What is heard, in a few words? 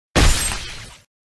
piercing thrust